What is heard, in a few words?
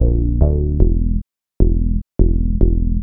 Dark Bass, Drum N Bass; Sonic Foundry (Sony Creative Software); Knutrix Drum & Bass